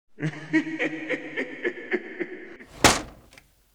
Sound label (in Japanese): 笑い声～バン; 耳物語